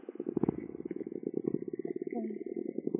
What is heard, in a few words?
Common or Grass Frog